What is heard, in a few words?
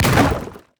tower brew Master basic Attack impact